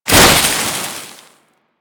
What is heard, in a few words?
Heat Gun Hit Effect; Grineer